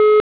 NetworkCongestion, Samsung SCH-M490; Omnia; CEPT

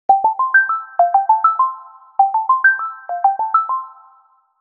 Ring Happy; HTC Touch Pro2

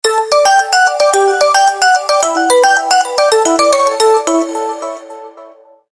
Knocking You, ringtones; Samsung; Galaxy A SHW-M100S

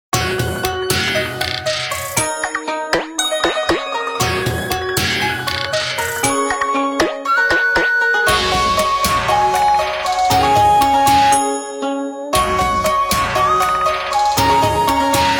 ringtones; Nokia; Asha 500

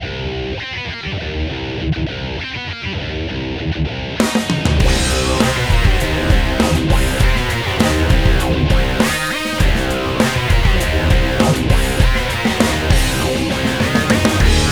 Rock LONG, ringtones; Google; NewWave Labs

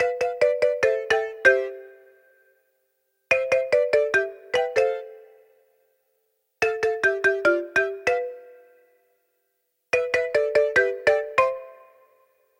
Marimba, ringtones; Meizu MX4

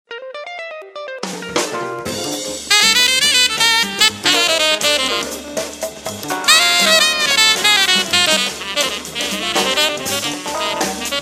Jazz Bar; Samsung GT-M3510 Beatb

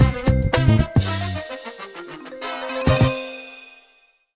Jazzy poweroff; Samsung SGH-i320; Power Sounds

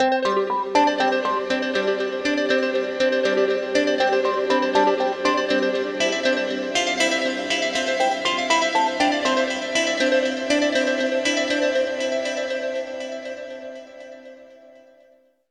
Commute, alarms

